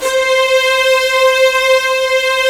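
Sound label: orchestra strings C4; GRANDSTRG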